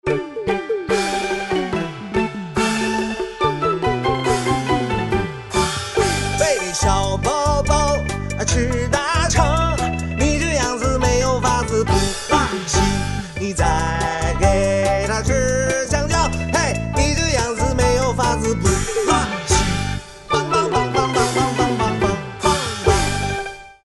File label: ringtone